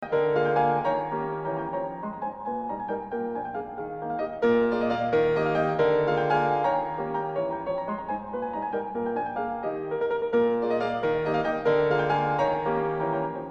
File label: Waltz E Major